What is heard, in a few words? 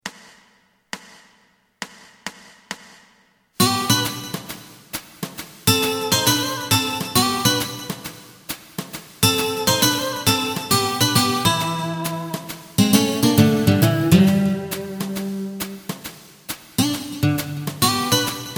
bass; エレキバンド用カラオケ